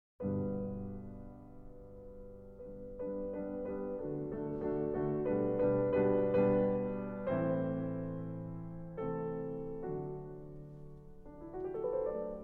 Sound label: Piano Concerto No. 4 in G Major; Op. 58; Beethoven